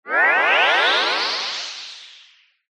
ascend chord ripple tonal descend brief dissolve into hiss resonant; dematerialise; skyclad sound